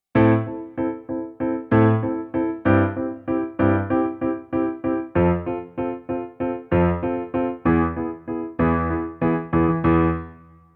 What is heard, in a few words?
Rhythm example